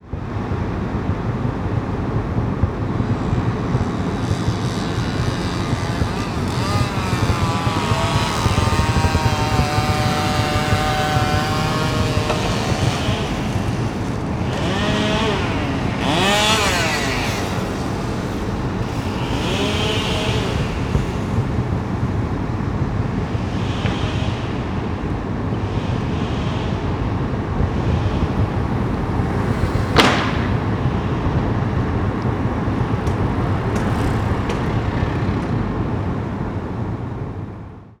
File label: Sound Sculpture logging